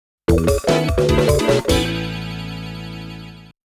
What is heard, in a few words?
Round Clear MUSIC; Namco Classic Collection Vol.2; arcade soundtrack